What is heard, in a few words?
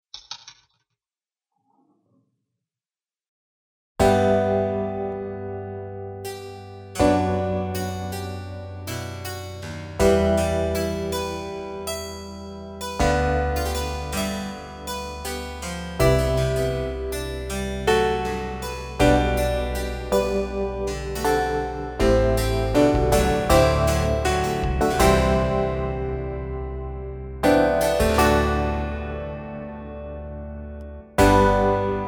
rain piano